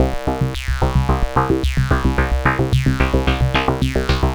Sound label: MODU C, Modular Synth Loops